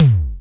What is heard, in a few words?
LOW SYNTH TOM; Greytsounds VOL-1; SDX TOMS